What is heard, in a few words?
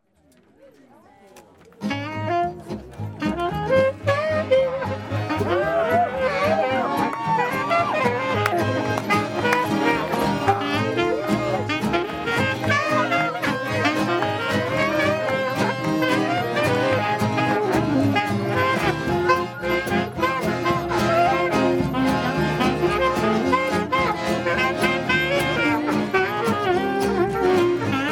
Kid Village Jazz Band